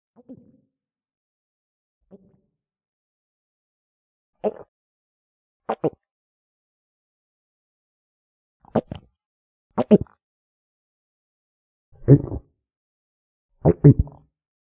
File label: some gulp sounds